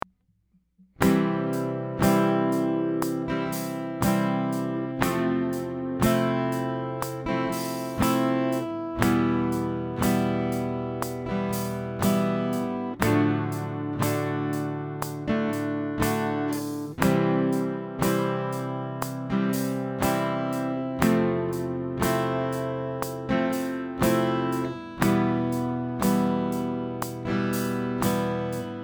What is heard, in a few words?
sad soundtrack